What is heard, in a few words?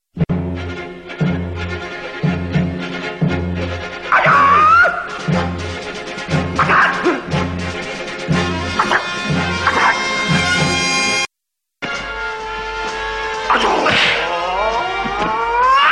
achoo; final-fantasy-iv